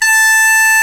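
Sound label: Scottish Bagpipes A3; NorthStar - Global Instruments VOL-1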